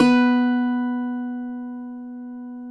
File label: CUATRO B 3, Guitars; Spectrasonics; Hans Zimmer Guitars VOL-2